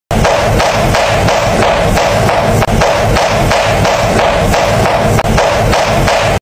sonidos extranos rebotantes

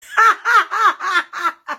risa

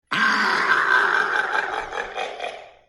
risa

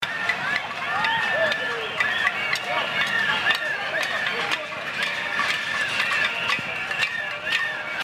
ritmo protesta cacerolazos

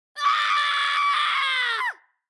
grito cartoon

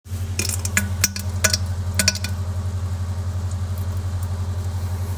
diarrea sound

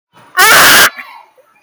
sonido suabe de la brisa

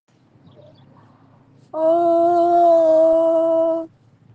cantando

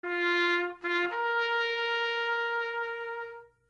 trompeta funeral

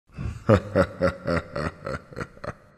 risa diabolica

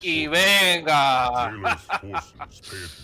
y veenga ha ha ha

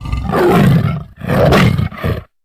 rugido de tigre

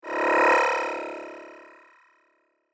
efecto sumbido

